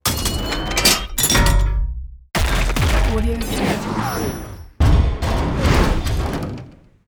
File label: robot moves clinks hits rattle slight junky; futuristic